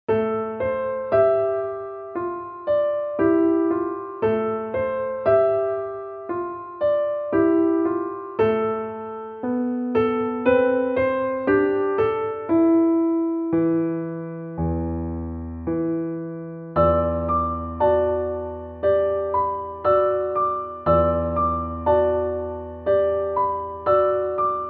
piano; ravel

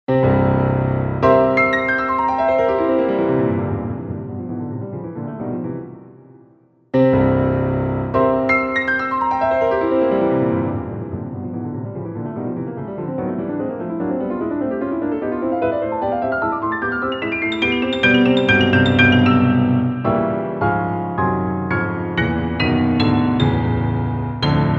etude concert; liszt

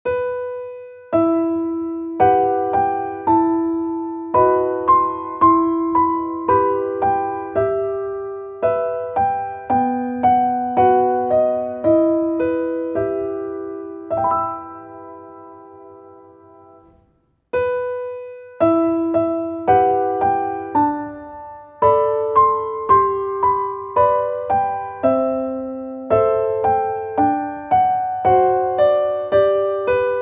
piano; grieg; solveigs

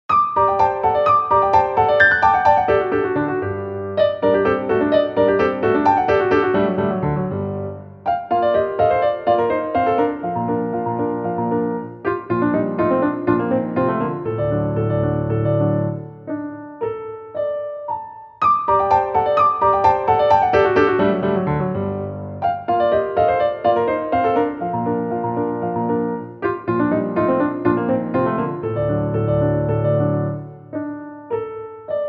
piano; grieg

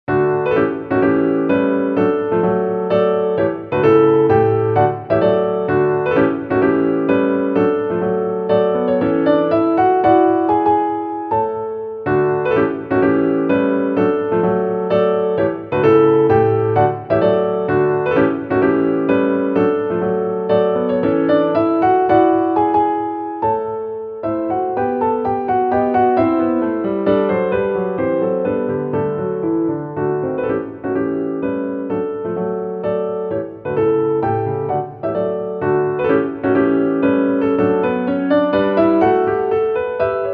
piano; schumann; 子供の情景 第２曲「不思議なお話」; シューマン

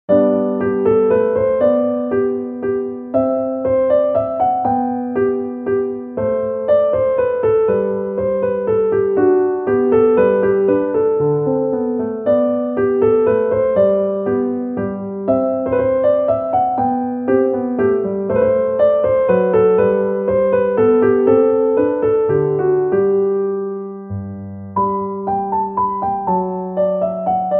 menuet concert; bach

